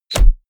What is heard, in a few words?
bow hit generic